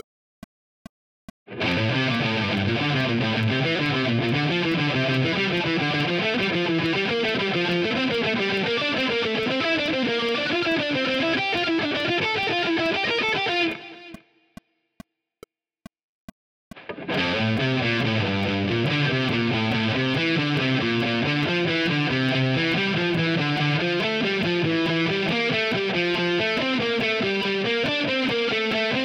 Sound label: triad arpeggios